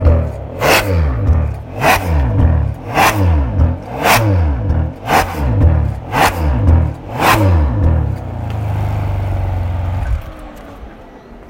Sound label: Ferrari, Ferrari 599 GTB Fiorano; A vendre Ferrari 599 GTB Fiorano